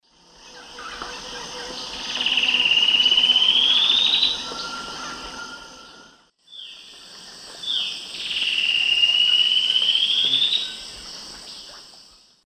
Xiphorhynchus obsoletus, song; S. America; Eastern Lowlands

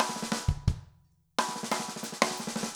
jungle 170 fill

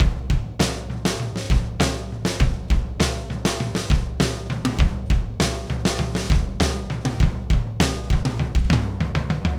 Backbeat Tom, Rock Drums

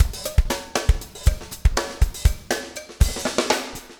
breakout 120, 120bpm, loops

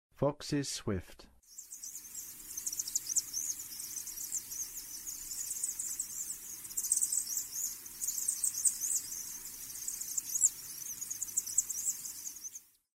Vauxs Swift; North America; Western USA; Western USA Songbirds

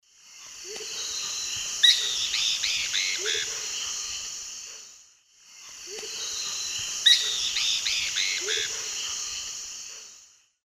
Philohydor lictor, alarm call; S. America; Eastern Lowlands

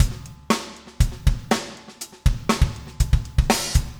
funk 120 bpm, loops

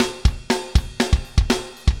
Double Time Beat, Rock Drums

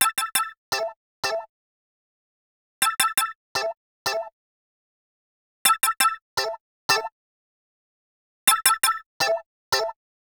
Guitar, RnB

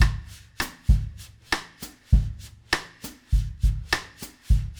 Drums, Jazz

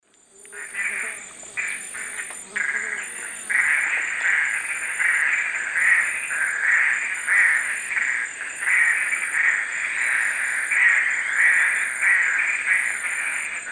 Pteroglossus inscriptus, group calls; S. America; Eastern Lowlands

